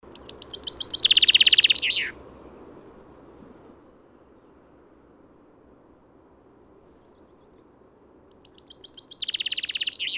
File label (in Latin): Fringilla coelebs; SETE